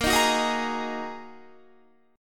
A sharp 7th Suspended 4th Sharp 5th down Guitar 4F 4A 5C 5G 5C 6E; strummed; JGuitar